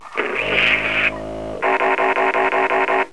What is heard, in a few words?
blast dead